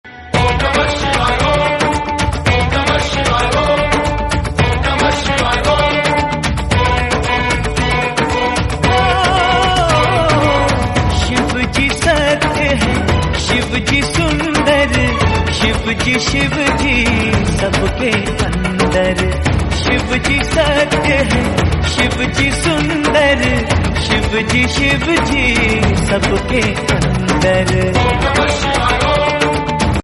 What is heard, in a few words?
Hindi Devotional Bhakti